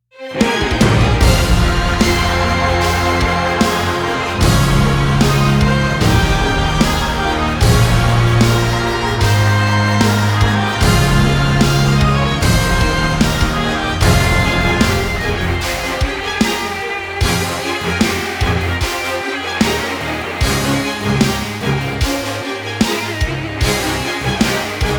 Victory Rich Triumpant

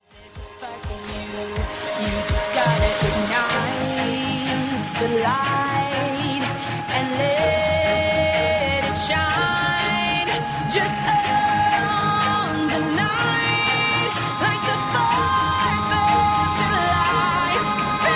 Firework

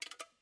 birdhouse with object, pool

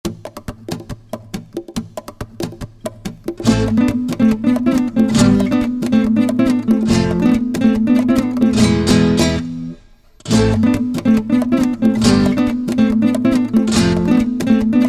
Flamenco Perc

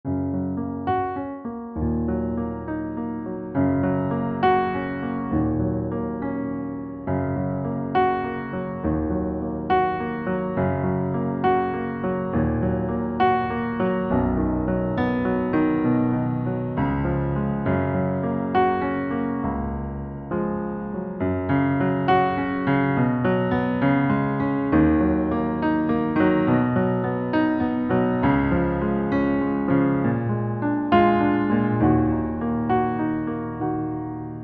piano

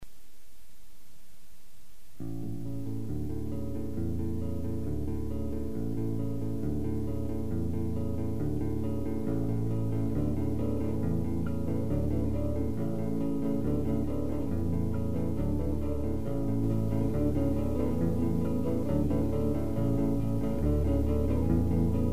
bass track song

